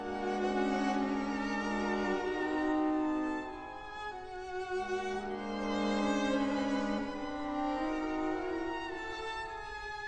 classical

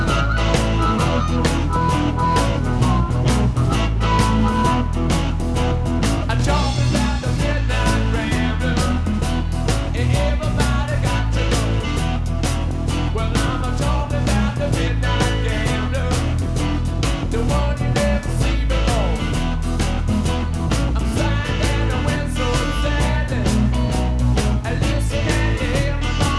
rock

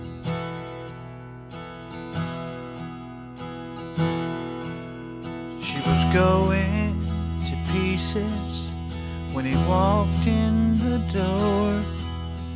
country

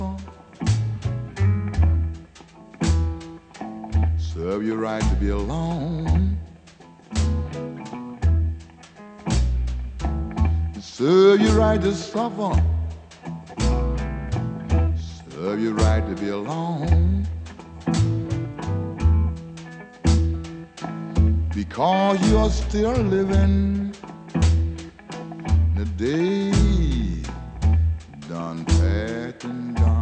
blues

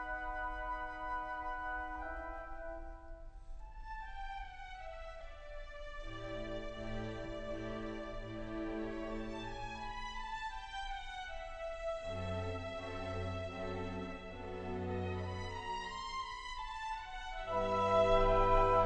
classical

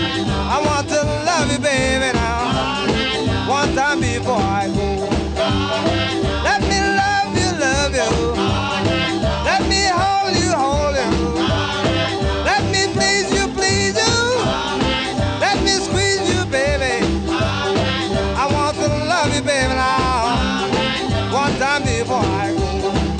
blues

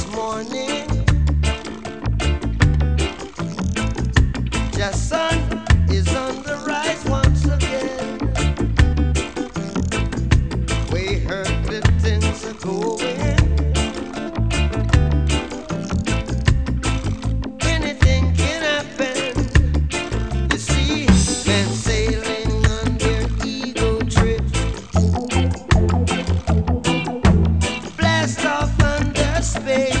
reggae

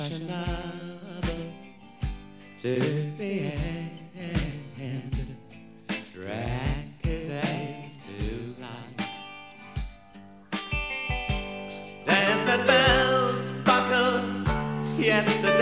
rock

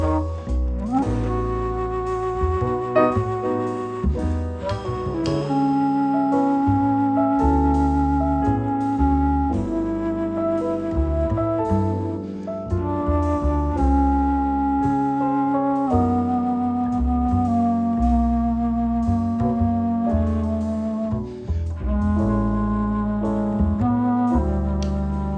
jazz

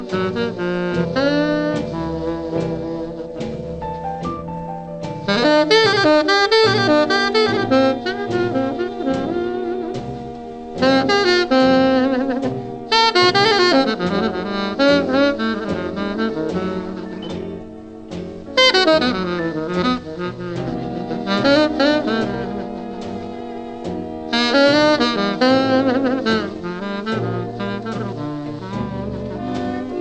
jazz

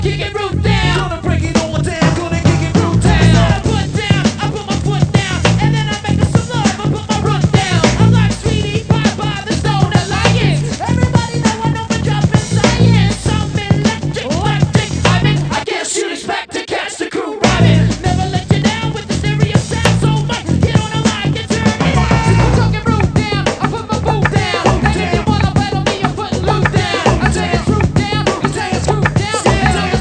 hiphop

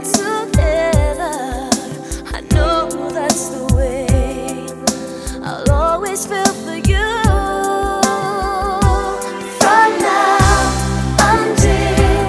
pop

